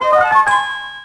pickup; XBOX; Loons The Fight For Fame; General

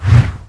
heavy whoosh

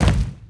boulder clack; impacts explos

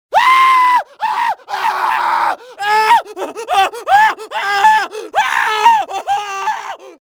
burn, pain death, male; Soldier Of Fortune II Double Helix; XBOX

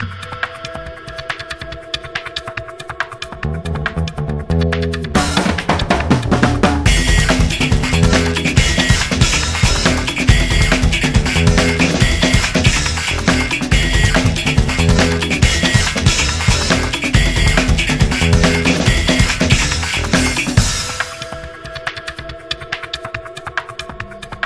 music car video; Volvo Drive For Life; XBOX

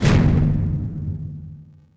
metal hit hollow; objects; Star Wars Obi-Wan; XBOX

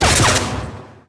lightsaber hit; weapons

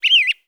Birdsong Oneshot; Totaled; XBOX

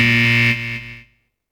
ERROR BUZZER; UFC Tapout

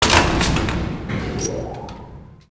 lift start; Max Payne

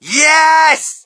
crowd, shout; Mike Tyson Heavyweight Boxing

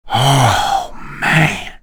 sigh; Cars

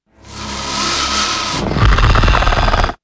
Raptor Hiss

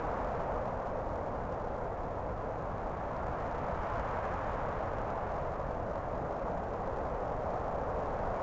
Wind; Rear

